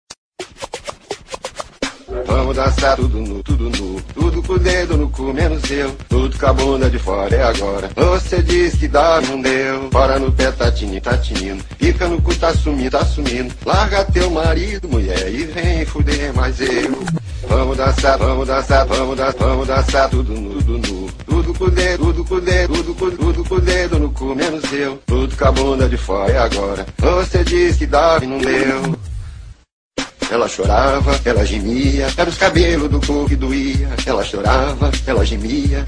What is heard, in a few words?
funk